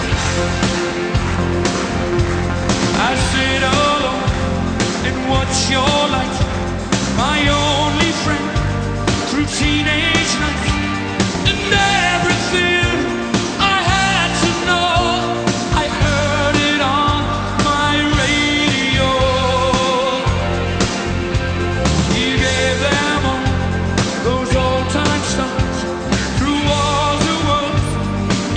rock

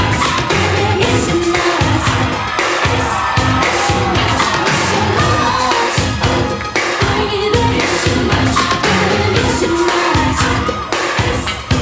pop

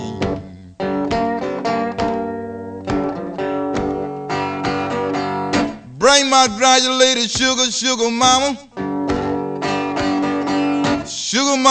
blues

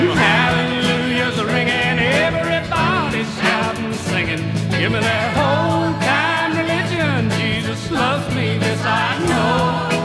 country

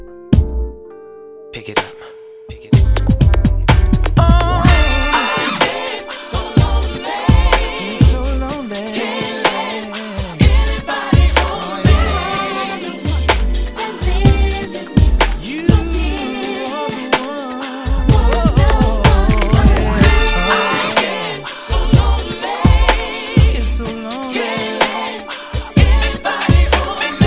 pop

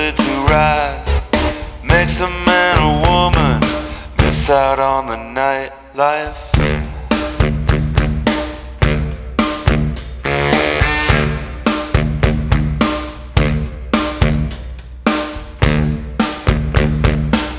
rock

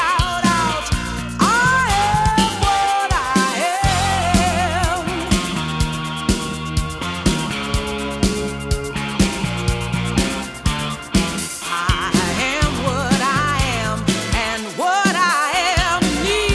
disco

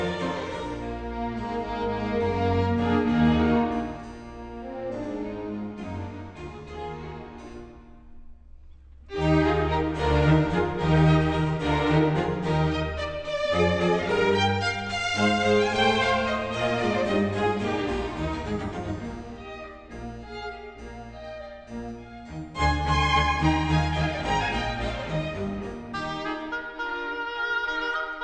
classical

